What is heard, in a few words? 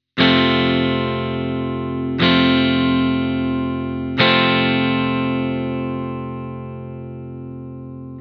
chord f major, guitar